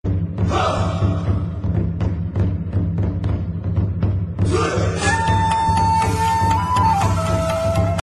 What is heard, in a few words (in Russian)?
naruto barabani